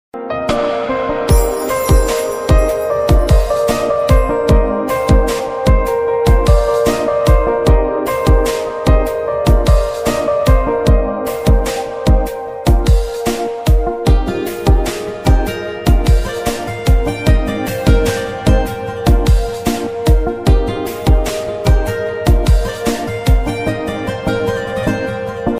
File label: instrumental ringtone hindi song ringtone sad ringtone